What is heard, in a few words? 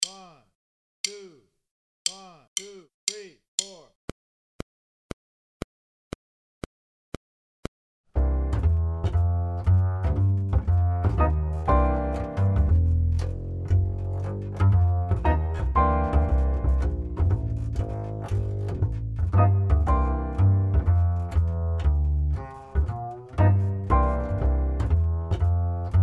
Swing 2bars, Drum Fills; Essential Drum Fills; Erskine